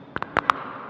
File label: Knuckle Notification Ringtone